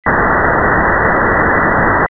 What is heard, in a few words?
2kHz lowpass noise